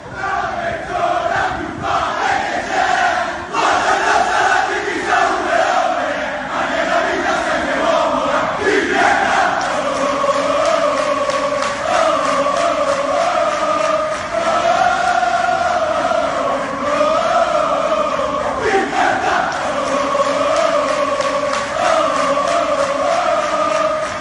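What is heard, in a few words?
best pisa chants, football chants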